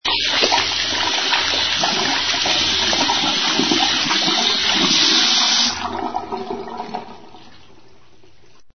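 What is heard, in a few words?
kitchen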